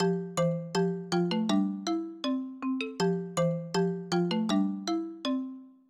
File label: ring; nheko